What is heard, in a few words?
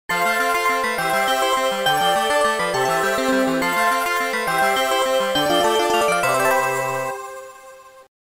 Game Over ゲームオーバー